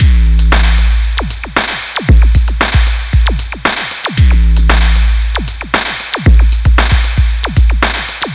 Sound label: 115bpm Boom Zap Breakbeat